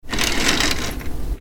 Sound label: Blinds Opening